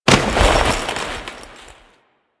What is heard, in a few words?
Foley Ledge Grab; Warframe; Kahl